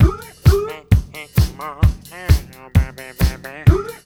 BEAT, Roots Disco; USB Soundscan vol.32; AKAI

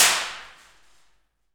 Aluminum Wall, FX Perc; Roland L-CD701

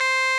RMI EP; Sonic Reality - Vintage Timetraveler

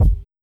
130 UK BD, Ragga & Dub Reggae; USB Soundscan vol.27; AKAI

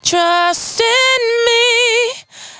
92bpm Bb, Velvet Vocal; Zero-G

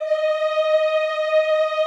EEH D#4, Extended Classical Choir; Best Service

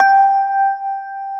JV SENSITIVE, JV-1080, PIANO; Syntec; Wall of Sounds

